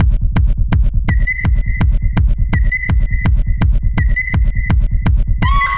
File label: battle intro; ReisenYoumuOuting